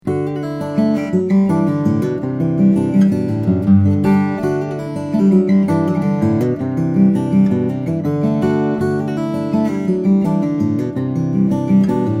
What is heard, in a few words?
beauregard sj, steel string guitars, flattop; small jumbo